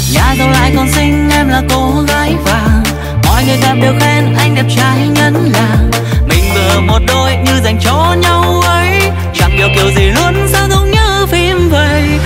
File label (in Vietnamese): Nhac chuong